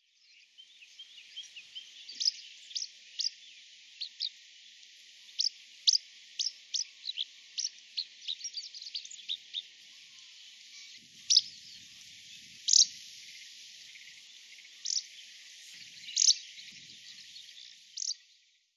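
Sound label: hibari, Eurasian Skylark; nasumachi; koe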